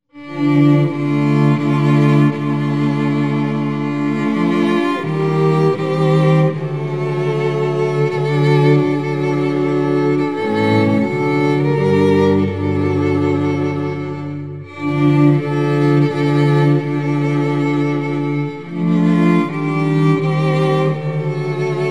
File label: third mode melody; hymns